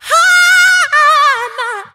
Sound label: ha na na SUNG; ooh ahh